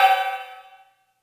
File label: Cymbals, Elektron MachineDrum SPS1 MKII